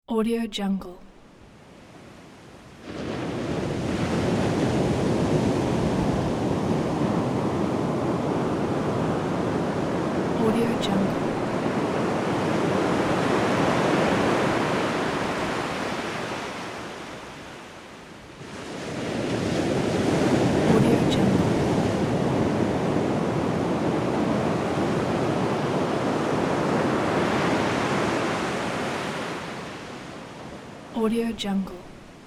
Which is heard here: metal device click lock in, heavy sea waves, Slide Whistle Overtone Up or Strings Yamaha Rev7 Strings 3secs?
heavy sea waves